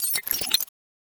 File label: Glitch